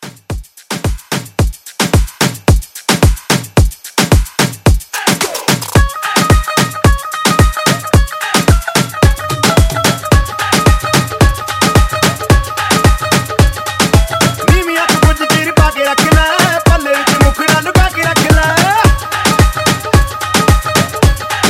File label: Moombahton Mixshow Mix 110